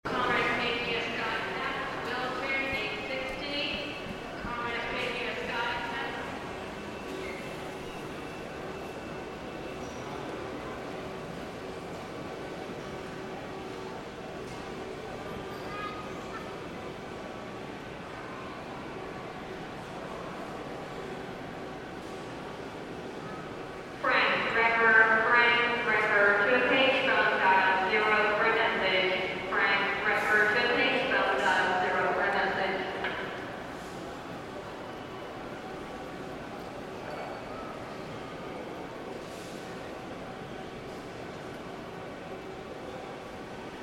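airport background few people female announcements; Звуки аэропорта; Звуки атмосферы терминала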